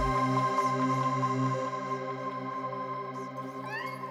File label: Dynamic Pad; Pads; BB 2HTC Samples Vol 4